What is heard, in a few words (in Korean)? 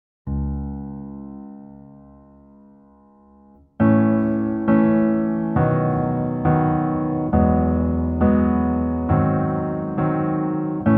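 Piano version -1키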